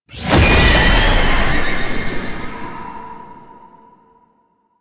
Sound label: Unyielding Gallop Impact; Warframe; Dagath